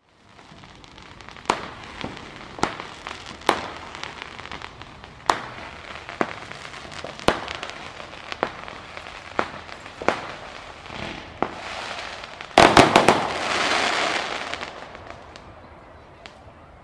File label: soundscape weoley hill fireworks